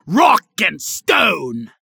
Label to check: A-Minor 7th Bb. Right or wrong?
Wrong